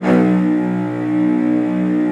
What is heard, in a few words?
CELLOS C#2, Strings; ILIO; Synclavier Strings